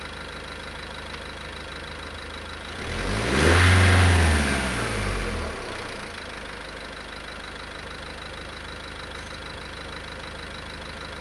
renault master f3500 dci135 engine start up idle gas pressing and shut down; mono; fvtt sonniss gdc audio